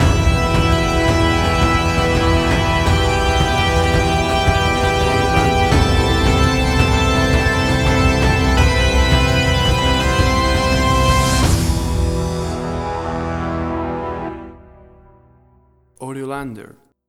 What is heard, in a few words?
Atmospheric Warm Hybrid Action Track; 15 SEC; AUDIOLANDER